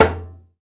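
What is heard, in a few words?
conga low, Latin; Roland L-CD701; PRC Latin 1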